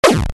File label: Sound Hurt; Dream Tower